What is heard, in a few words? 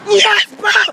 yes scream